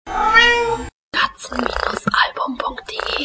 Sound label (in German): Kater Miau, Miau eines Katers; katzen-album